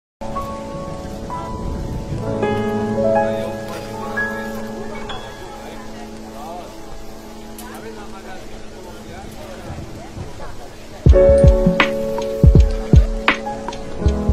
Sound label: music